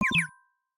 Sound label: Tweet, notifications; Samsung Galaxy S25 Ultra